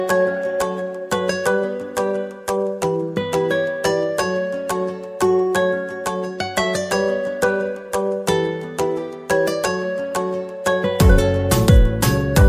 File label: Ringtone